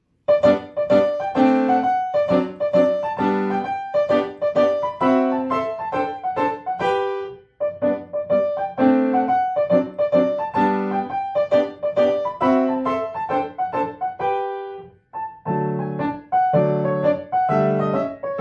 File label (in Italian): Scozzesi per orchestra riduzione per pianoforte; Scozzesi 12